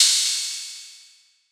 big room crash cymbal sound sample C key, crash cymbal single hit tuned to the C note